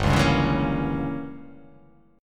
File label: G Diminished 7th